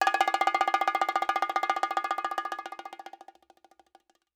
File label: Acoustic Percussion